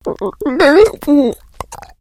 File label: become alert, infected; Left 4 Dead 2; Source Engine